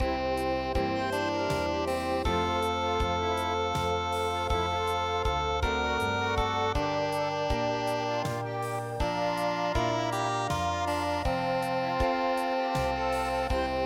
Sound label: step da step krugom 3 4 Pops